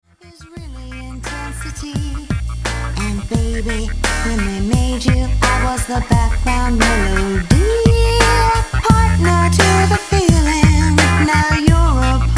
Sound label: background melody